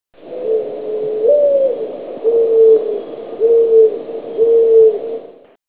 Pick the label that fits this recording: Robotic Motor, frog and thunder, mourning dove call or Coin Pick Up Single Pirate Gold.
mourning dove call